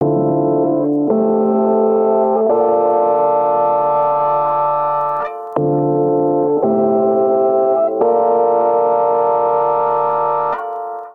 Rise Clav D Minor 86 bpm; MIDI Clips; Tonal; Accompaniment; Ableton; Factory Packs; Chop and Swing

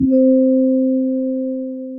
Pianoglass Resonance; Instruments; Broken Keys; Ableton; Factory Packs; Mood Reel

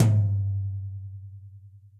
Tom Multirod Low Multirod Dry; Drums; Drum Hits; Ableton; Session Drums Studio; MPE

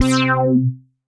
Gratis Syn-Brass; Synth Brass; Ableton; Creative Extensions; Poli

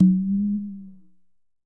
Tumba C Slide, Conga, Latin Percussion; Drums; Drum Hits; Ableton; Factory Packs

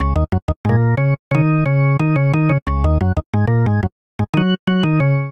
Phrase R&B Organ Eb Minor 90 bpm; Electric Keyboards; Ableton; Factory Packs

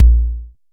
analog tonal, Kickbank

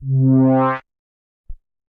Slip n Slide, Synth Keys; Ableton; Creative Extensions; Poli

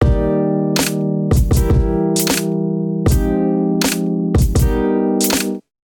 kit groove 79 bpm; MIDI Clips; Road Monger Kit groove; Ableton; Factory Packs; Beat Tools

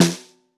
Snare Bottom; Ableton Foundation FreePacks; Snare Designer

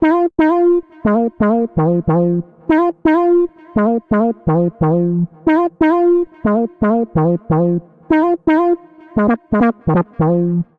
Evening Casual Bb Minor 89 bpm; MIDI Clips; Tonal; Melodic; Ableton; Factory Packs; Chop and Swing

